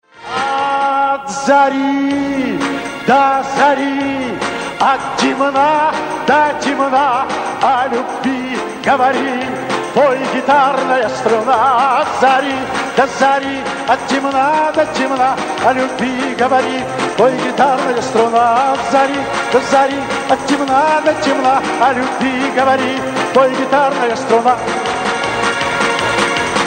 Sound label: gitara